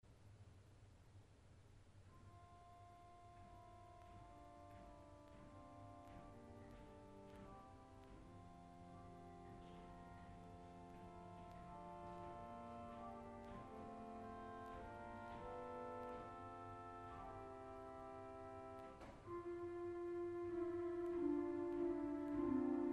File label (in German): Vox Coelestis Streicher